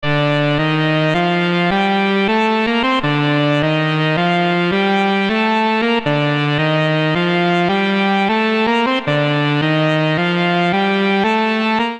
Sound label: Funk Synth Melody Cminor 80bpm; MIDI Clips; Melodic; Ableton; Factory Packs; Chop and Swing